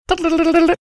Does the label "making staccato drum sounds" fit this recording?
Yes